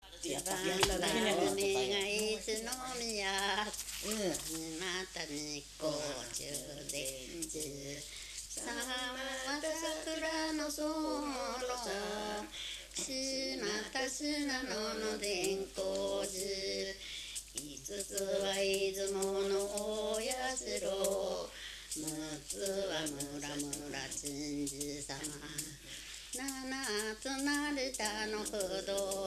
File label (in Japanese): itibanhajime, minyou; 酒々井町; 一番はじめ